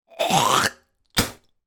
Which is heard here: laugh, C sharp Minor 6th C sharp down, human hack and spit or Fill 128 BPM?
human hack and spit